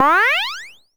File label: retro jump collect bonus; Gamemaster Audio; Fun Casual Sounds